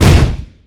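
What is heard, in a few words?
grenade explosion; AssaultCube; weapon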